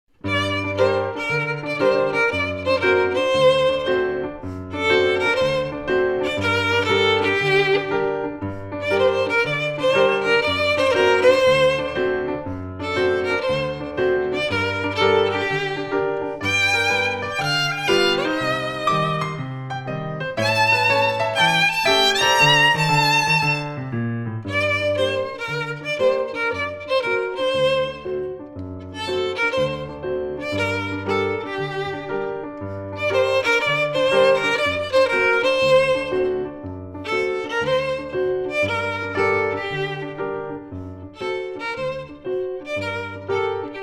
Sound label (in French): violon et piano; IUAV; musique instrumentale